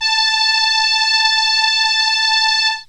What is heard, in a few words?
Solina Trumpet A4; Analog Synths; Ableton Foundation FreePacks